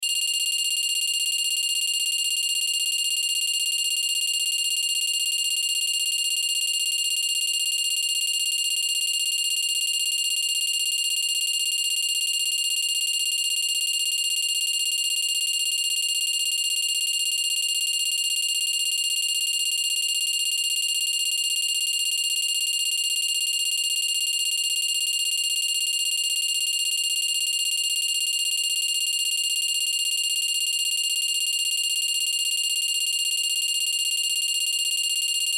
Bell Alarm Small Digital Loop; Electronics; Blastwave

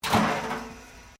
METAL BIN IMPACT WIRE FENCE; Materials

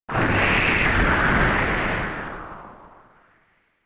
Space Lightning SciFi Windy Whoosh Static; Distortion; Science Fiction Horror; Sci-Fi (Sound Effects:Science Fiction)

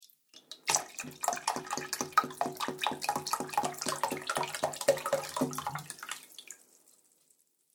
Water Bubble Sounds; Water Sound Effects; Agcnf Media

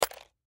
Bullet Shell; Bullet Shell 9mm Drop Debris; Drops (Sound Effects:Objects); Blastwave; Warfare

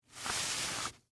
Rope Pull Twine; Hand Tool Sound Effects; Hand Tools (Sound Effects:Tools); BlastWave

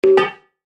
Chime Percussion; Multimedia

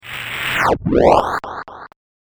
A Tarian Wormhole, science fiction; star wars / trek style

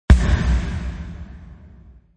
Velocity Snare, Impact hit; Impact hit for music production, video editing, movements, logos, flash, or animation

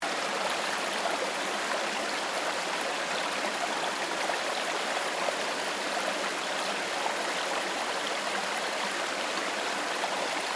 Water Stream Ambience, Loop; Water Sound Effects; Nature; BlastWave

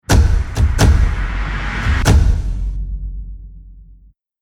Drum Impact Movie Trailer; Sonic Logo Sound Effects

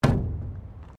Wood Panel Thump; Material components for constructing more complex sounds; Sound Effects:Materials

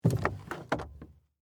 Foley, Suitcase, Pick, Up, Empty, Hard, Shell; Pick Ups; BlastWave

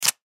Ticket Tear, Ticket Tear Short; Ripping; Foley

